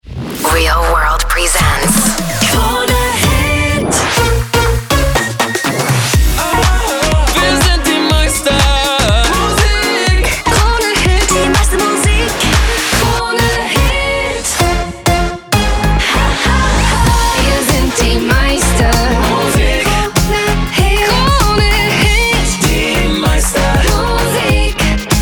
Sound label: Jingles; kronehit; 2024 Launch Demo